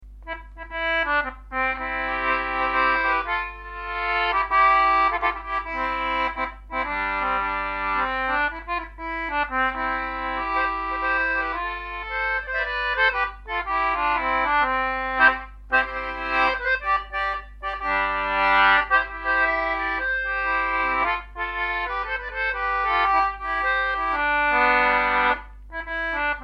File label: Concertina; Strike the Bell